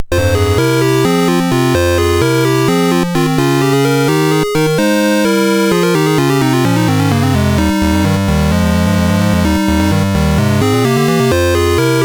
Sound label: Dragonworld sid MOS6581R4